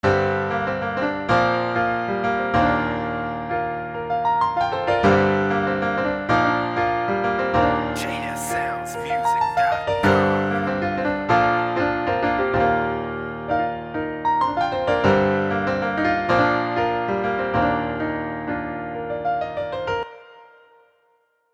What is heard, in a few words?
Piano Vibe